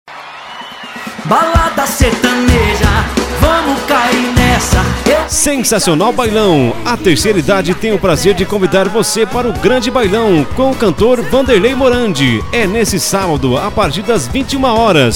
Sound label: FORRO